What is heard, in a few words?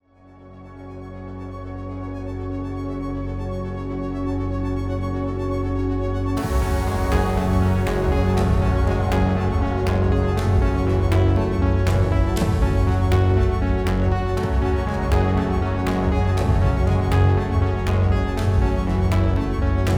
Crash Landing - Short; Homebound VR